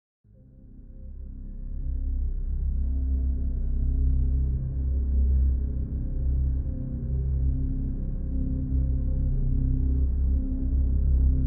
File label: pad